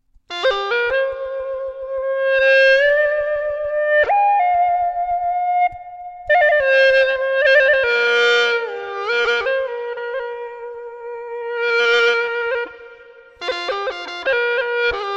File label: hulusi